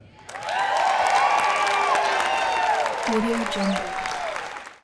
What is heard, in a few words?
crowds theater cheer theatre 500 people applause yea; تشویق کوتاه جمعیت; human sounds